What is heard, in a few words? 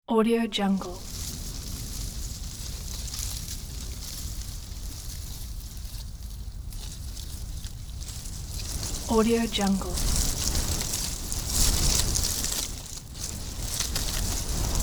wind in palm